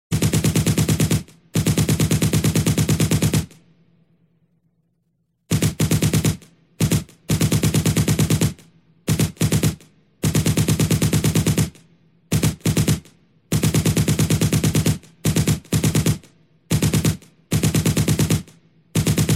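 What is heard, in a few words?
Machine Gun Rapid Fire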